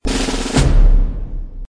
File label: Levelup Sfx Big; breach clear; android ios linux macos mobile ps vita windows